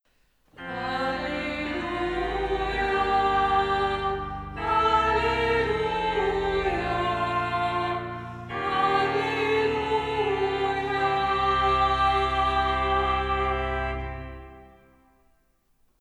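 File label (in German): Liturgie Halleluja Chörchen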